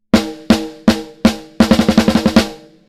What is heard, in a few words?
Snare Enhance; PHYSION Mk II